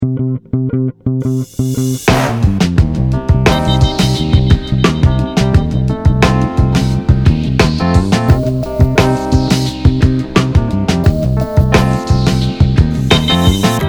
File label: Casino, soul and funk